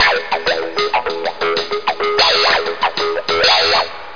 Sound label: walk Music Long; Fruity; ROLLUPS